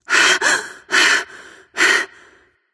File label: breath light; smite; voicepack; hel